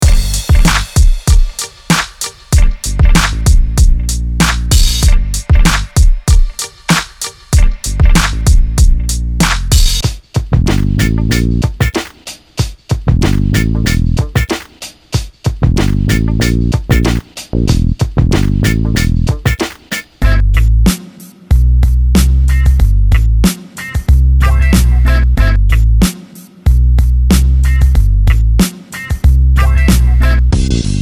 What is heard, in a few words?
Funk Lord Beat